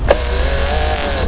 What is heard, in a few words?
SERVO, MACHINES